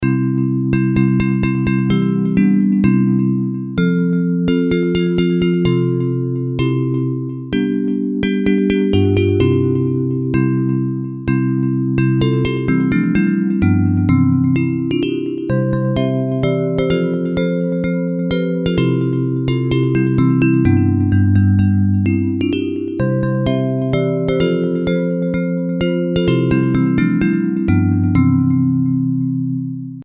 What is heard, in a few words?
Bells